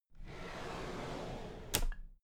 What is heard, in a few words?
drawer cabinet equipment open soft